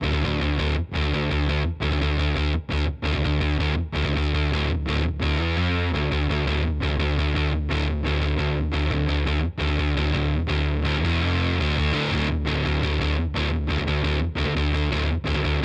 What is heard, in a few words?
Source Audio Ultrawave SA 250